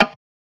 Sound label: perc